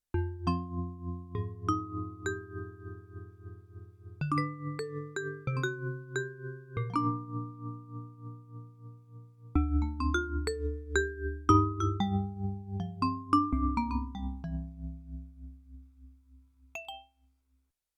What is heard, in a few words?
VIBRAPHONE; Yamaha TX16W; Jazz Performance